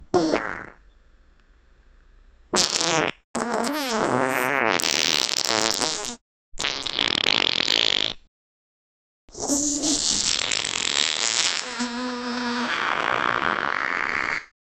really disgusting juicy w, disgusting

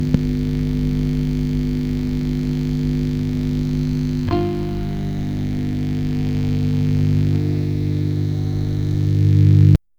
plugging an electric, electric guitar

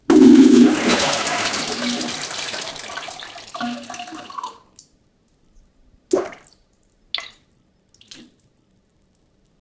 farting and pooping in to, toilet

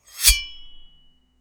a large sword unsheathe

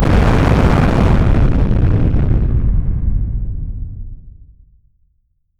quake explosion, earthquake

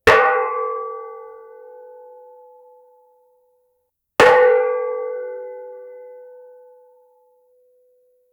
single impact sound of a, railway

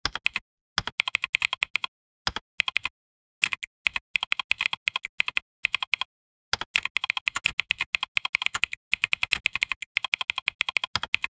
keyboard typing sound

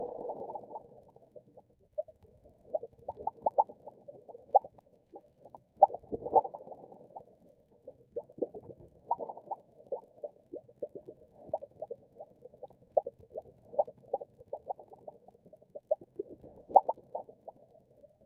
soft and delicate sound o, aquatic

